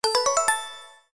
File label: win